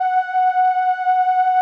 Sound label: SOLINA, String Machines